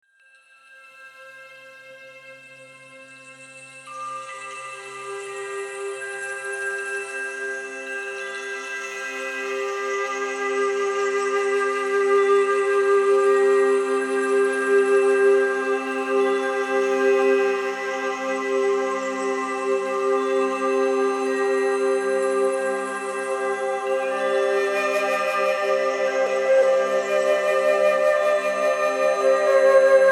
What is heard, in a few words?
Love Frequency Meditation